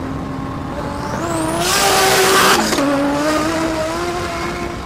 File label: 800Hp Supra Fly By